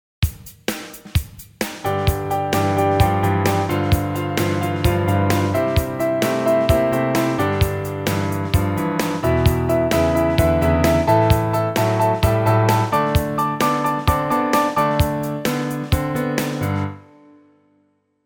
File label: rock, music